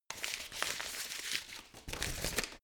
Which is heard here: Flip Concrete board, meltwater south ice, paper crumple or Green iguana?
paper crumple